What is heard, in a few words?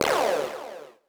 Synth Hit D; Trance; Synth Hits; Zero-G Trance Inducer; 90 s Sample CDs